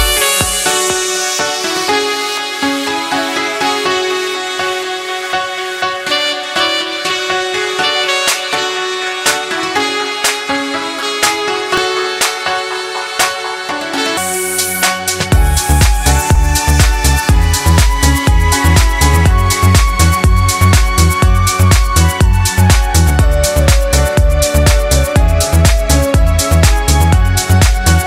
Sleep Music Ringtone

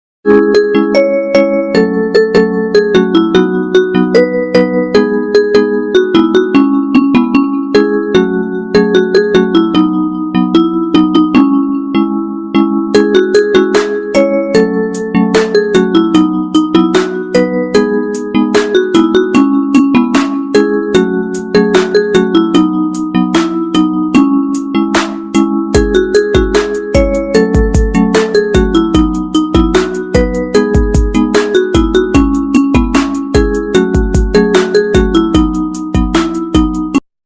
Instrumental English Ringtone

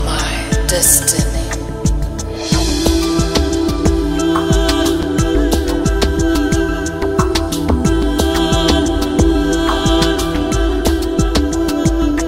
Malayalam Music

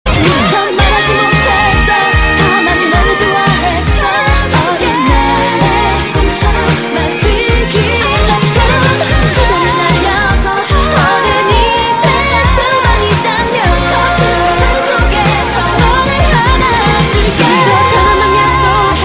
English Song Ringtone

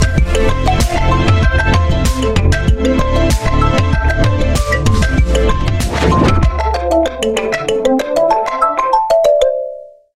oppo Reno 3 Pro Ringtone

